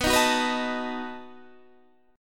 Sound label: B 7th B down Guitar Half Step Down